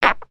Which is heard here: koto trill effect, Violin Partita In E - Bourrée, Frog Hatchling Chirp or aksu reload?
Frog Hatchling Chirp